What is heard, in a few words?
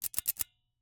Ice cream Scoop, metal, mechanical, teeth, gear, squeeze, release, double; Eiravaein Works; Vaeyan IV